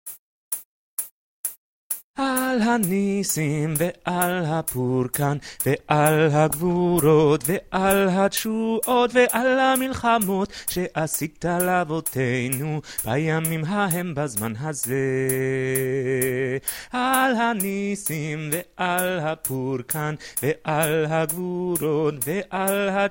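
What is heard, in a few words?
sopran, choir music; BCC choir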